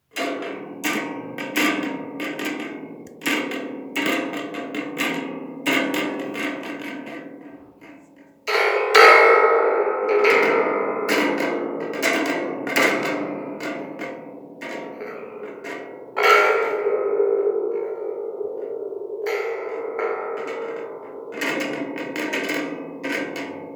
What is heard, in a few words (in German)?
Donnertrommeln Schüttelinstrument